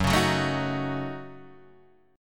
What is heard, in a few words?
A 6th Flat 5th F sharp, chord